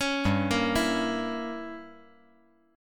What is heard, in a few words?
G Diminished 7th G, chord